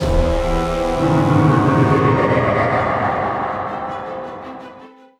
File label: Game Over Start; Might and Magic X Legacy